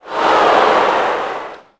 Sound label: NEG Reaction,Crowd; Cars; XBOX